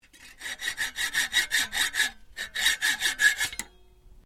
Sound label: HACKSAW TRIM, domestic hacksaw; Saws (Sound Effects:Machines)